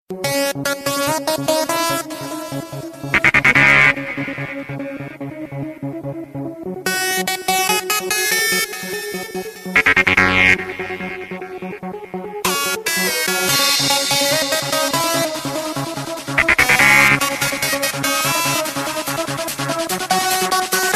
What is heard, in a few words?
trance stunning; ringtones